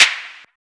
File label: Claps; Vengeance Samples